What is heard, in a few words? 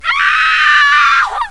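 woman scream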